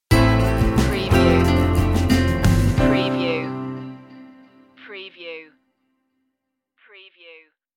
possibilities stinger

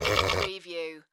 Horse Stallion Snort; Sound Effects Bible

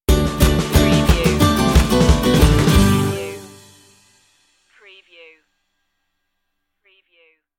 life stinger

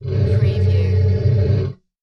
Monster Moan Groan

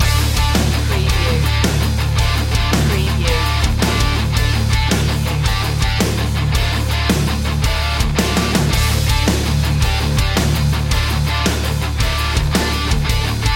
battle ready loop

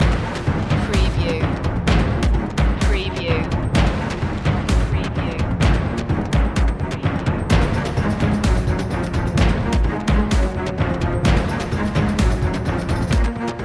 black heart loop

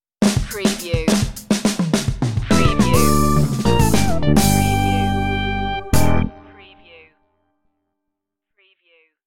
do it funky stinger

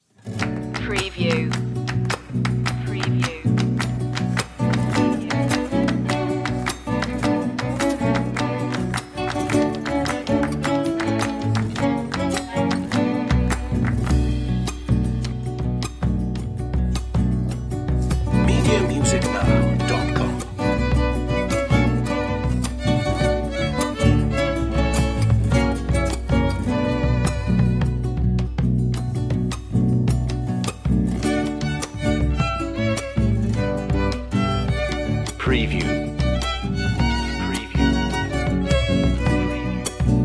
corazon de chacarera no party noises

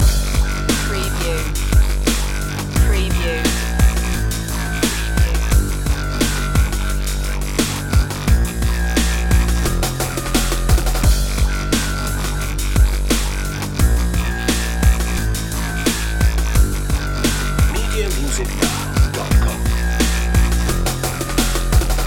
new dnb dream loop

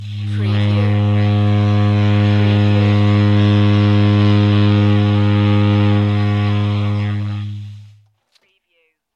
Sax Low Tone

